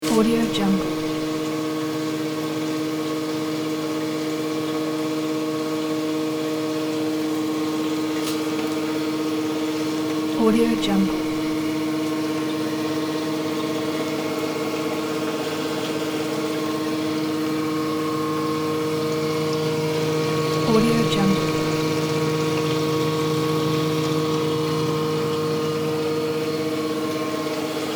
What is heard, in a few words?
dish washer working